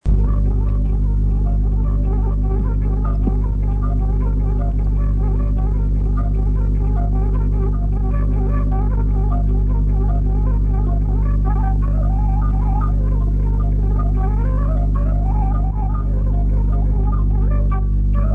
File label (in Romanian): Batraneste I la fluier; jocuri si cantece batranesti; Vaslui